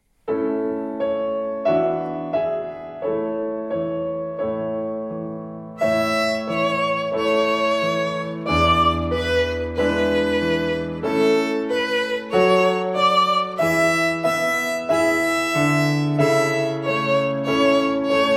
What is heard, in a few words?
Lightly Row in Performance Tempo